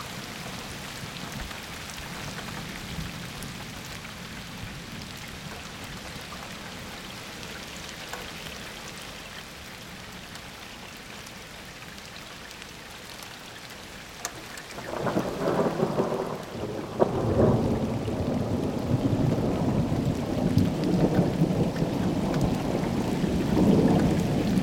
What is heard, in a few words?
summer storm